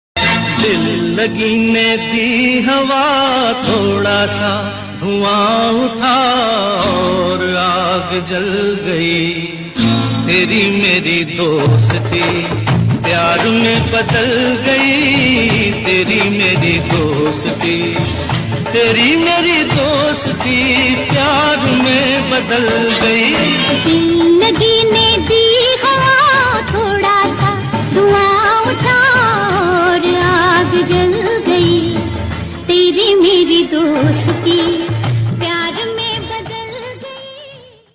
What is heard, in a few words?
song ringtone hindi song ringtone; zeenat aman